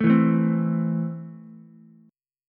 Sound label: E Suspended 4th Sharp 5th E down Ukulele; Esus4#5 strummed